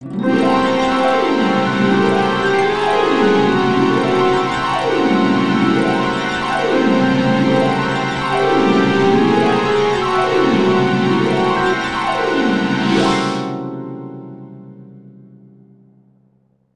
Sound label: Sitar